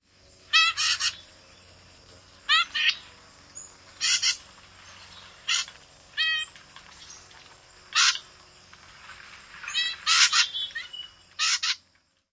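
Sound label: Blue-headed Vireo family group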